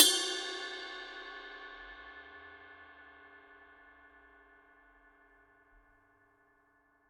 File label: signature dark energy 22 dark energy ride mark i bell